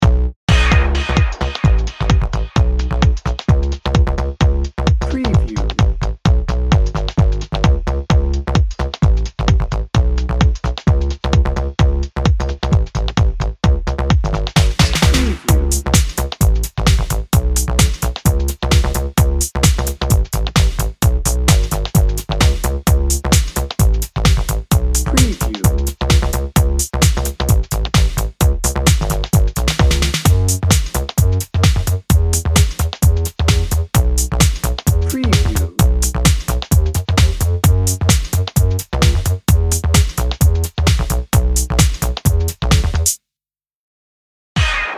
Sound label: happy techno dnb